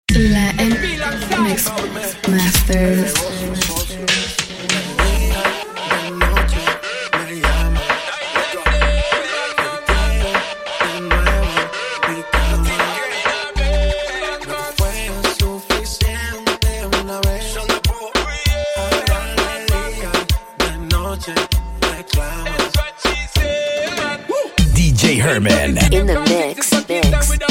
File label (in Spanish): REGGAETON MIX